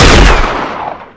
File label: Sniper Fire